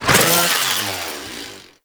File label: Hand Saw Whoosh